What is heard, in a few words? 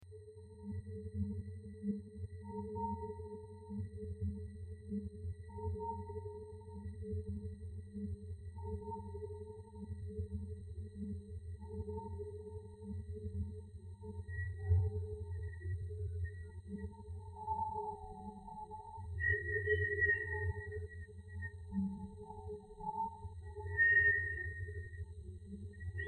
ducks unfiltered